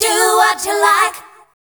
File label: FEMALE CHOIR, Dance Vocals